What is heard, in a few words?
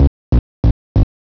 bgm